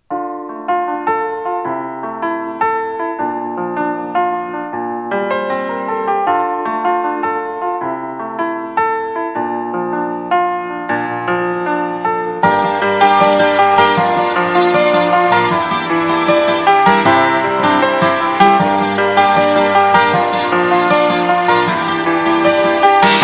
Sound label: light piano